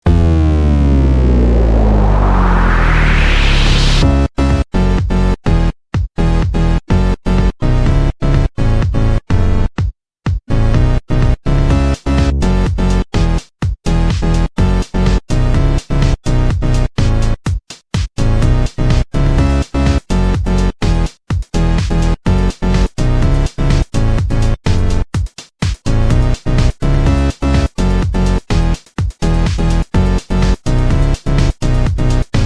You Lose; block breaker 3 free; android

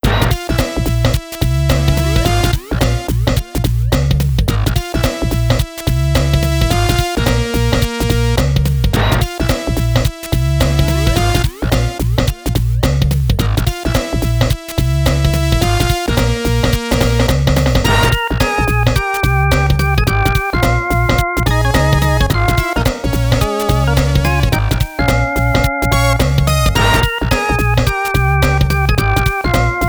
Jungle; ActRaiser

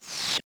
Gui close tab; LittleBigPlanet 2; PS3

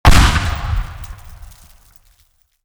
explosion; teardown ps5 windows xbox series xs gamerip 2022

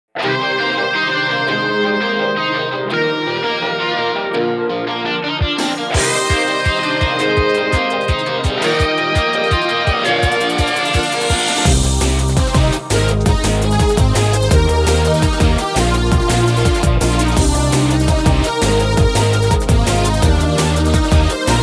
Music event; Jikkyou Powerful Pro Yakyuu Success Special; PS Vita

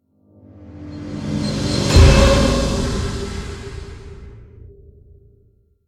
Stinger Swell; Naughty Bear: Panic in Paradise; ps3; xbox 360

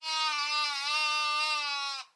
Lamb Panic

